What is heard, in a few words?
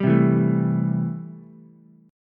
C 6th C down Ukulele; C6 strummed